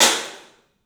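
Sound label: club cymbals; 3D Sound; 3D Drums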